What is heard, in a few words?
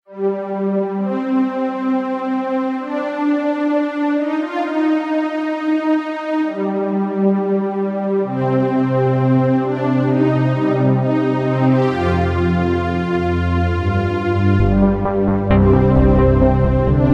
Electro